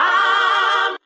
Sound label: zunga, Voice